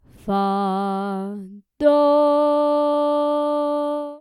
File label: subdominant tonic